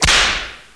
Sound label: Fight; 游戏音效; 打斗音效; 特酷声音素材库